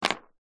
industrial screw or nail set down on plastic tool box lid